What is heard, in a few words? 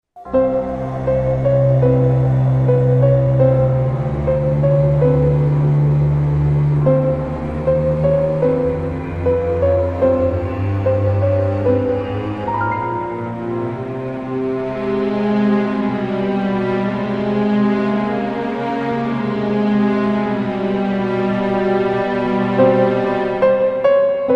Cemetery Bell